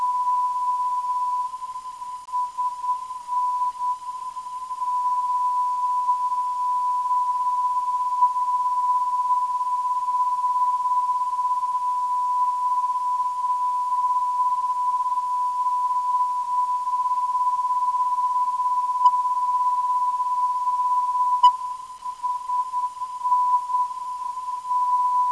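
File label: non-directional beacon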